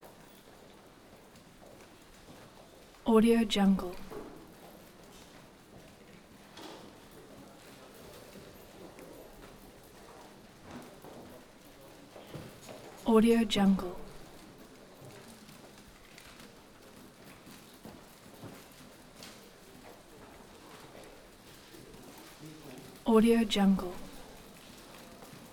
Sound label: office movement typing foot traffic; prosoundfx